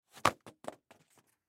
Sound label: Hockey Glove, Hockey Glove Multiple Throw Down; SoundEffects-Blastwave; Sports